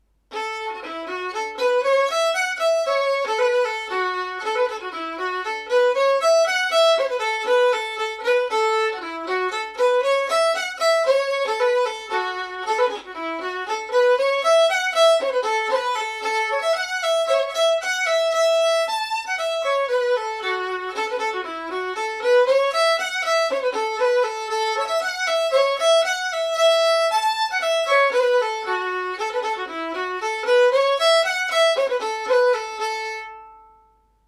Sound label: Fiddle; Munster Bank